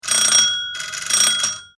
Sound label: Household Door Bell Ring; Doors